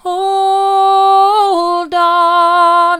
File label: hold on soft, vocal